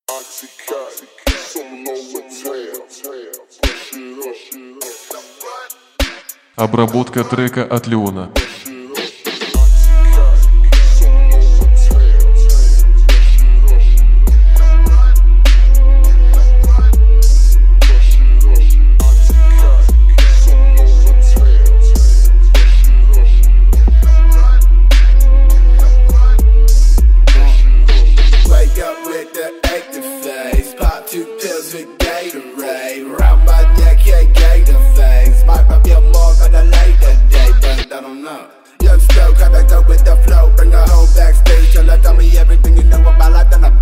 Low Bass, 41-46Hz

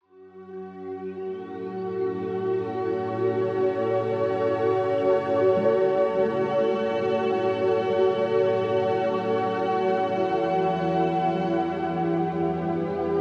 Relaxing Music tibetan meditation music

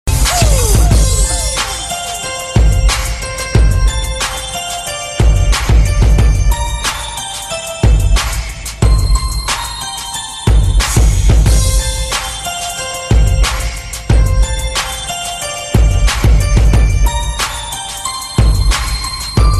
Christmas Hip-hop Remix, Jingle Bells

